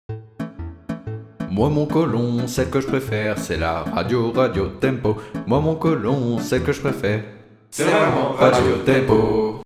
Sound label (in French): radio tempo choeur